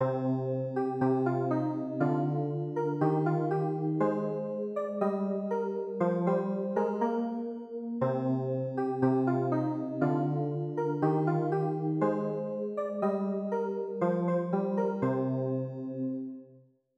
CLAVIER; ALLELUIA colombiere

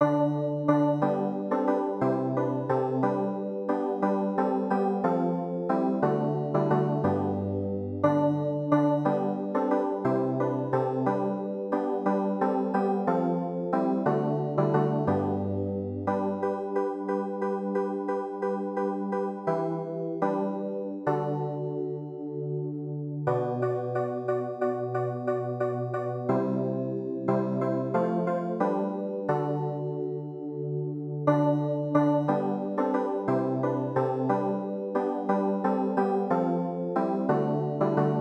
CLAVIER

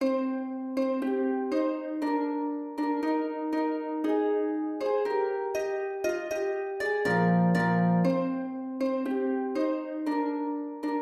HARPE; ALLELUIA colombiere

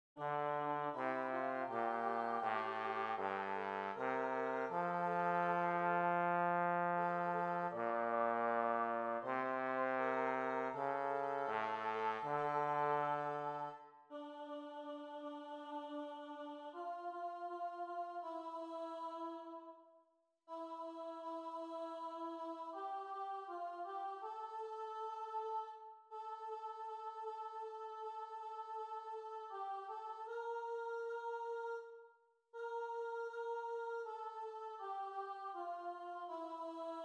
psaume trombone

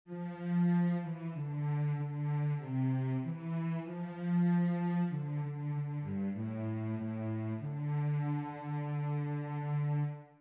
KYRIE VIOLONCELLE; St Jean

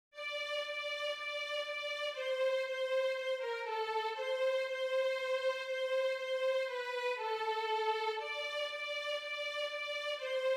ALLELUIA VIOLON; St Jean

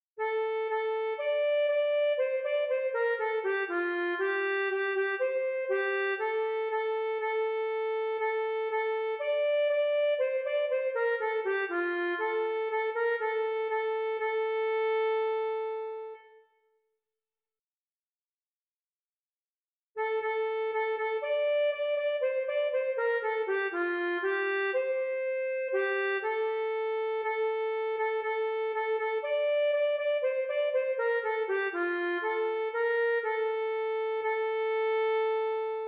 SANCTUS ACCORDEON; St Jean

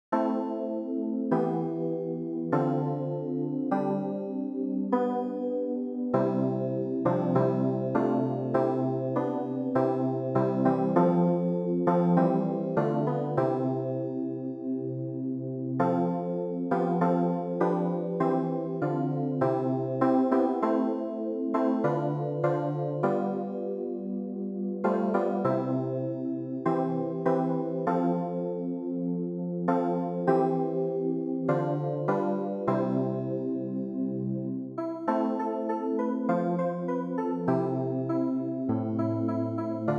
clavier

